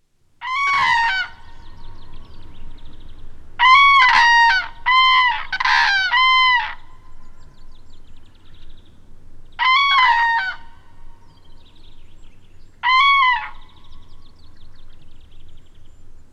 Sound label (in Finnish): Kurki; lintuja; nauhalaisen aanimaailmat